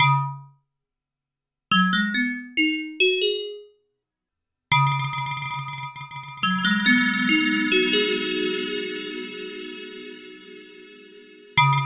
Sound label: KORG FM Bell